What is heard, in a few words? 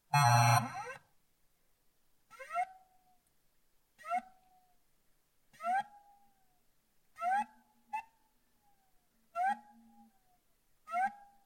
Dying Hard Drive